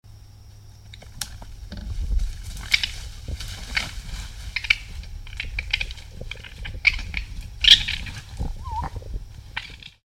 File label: Raccoon